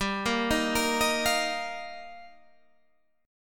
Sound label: G Minor 7th Sharp 5th, Guitar, Standard; arpeggiated; capo 2; 15,13,13,15,16,13